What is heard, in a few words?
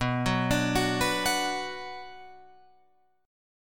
B-Minor, Guitar, Open F; arpeggiated; JGuitar